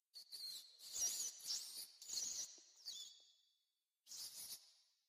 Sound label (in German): ratten; minecraft